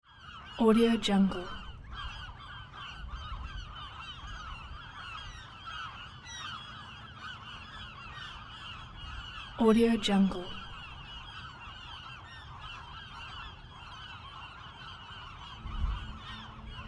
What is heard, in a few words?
seagulls on the sea and sea port